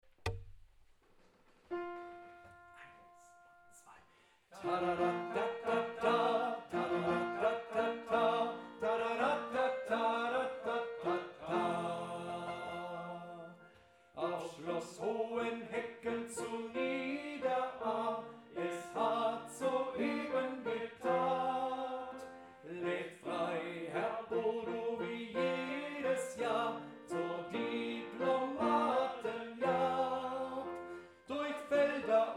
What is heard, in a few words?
tenor